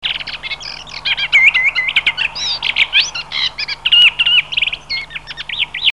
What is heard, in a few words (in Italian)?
Forapaglie castagnolo